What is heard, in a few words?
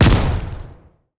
USB explode